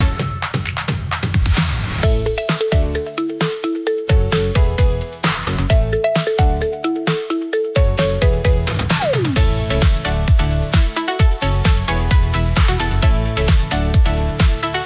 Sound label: loop